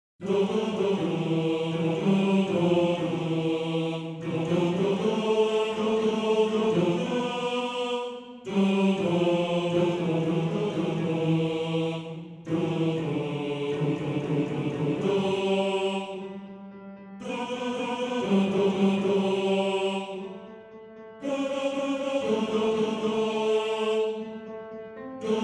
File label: Bass; Bass 1